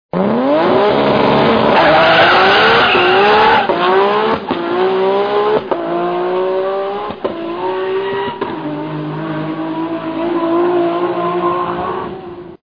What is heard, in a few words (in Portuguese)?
gtr largando